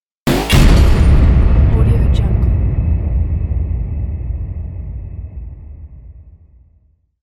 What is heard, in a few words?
explode to; 3dhome